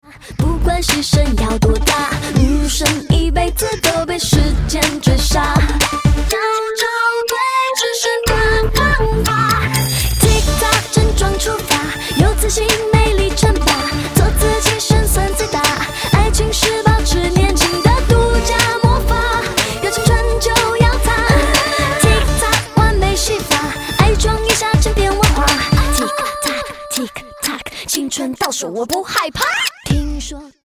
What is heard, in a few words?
ticktock